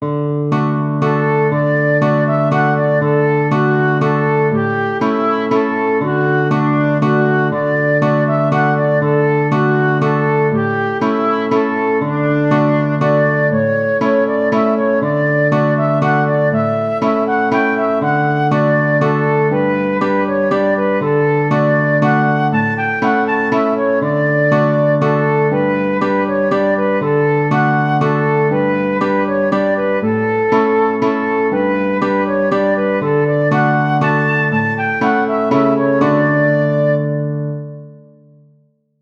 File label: waltz